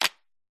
household plug socket 4 way extension extract phone charger electric